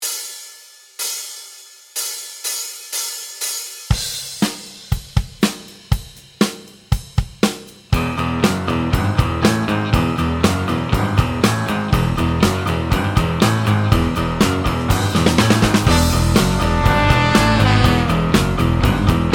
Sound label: Drum Recital